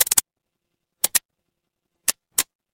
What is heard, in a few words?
mechanical gear